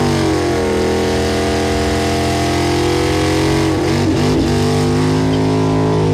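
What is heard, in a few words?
overdrive; piltover customs blitz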